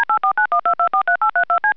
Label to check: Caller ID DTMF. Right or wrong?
Right